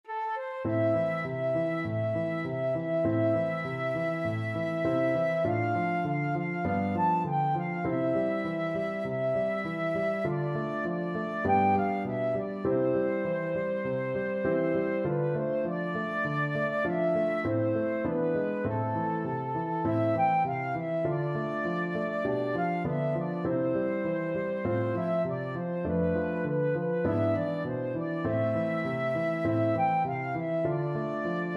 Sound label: flute